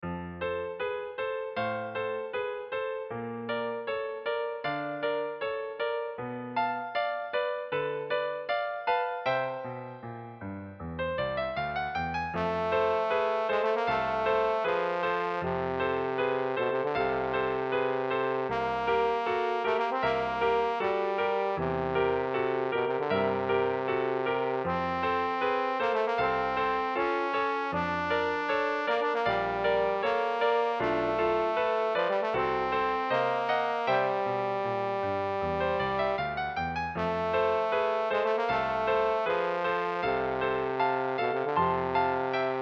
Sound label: arietta for baritone euphonium